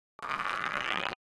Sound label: glass